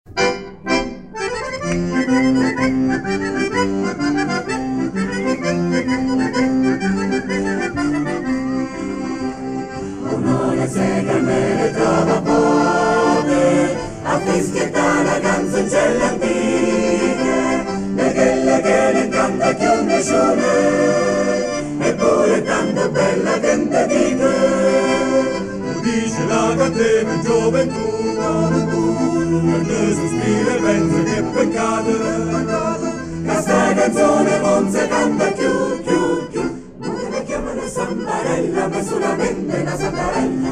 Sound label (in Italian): armoniz. a 4 voci dispari; armonizzazione a 4 voci dispari; ciociaro